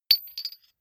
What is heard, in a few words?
medium metal impact medium rolling